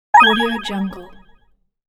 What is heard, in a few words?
healing